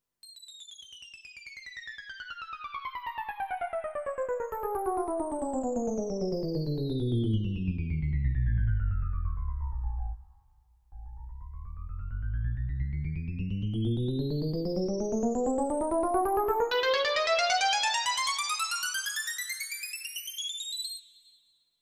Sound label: E piano